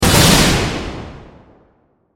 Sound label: Force Field Hit, Science Fiction; Force, Field, Hit, Science Fiction, 5.1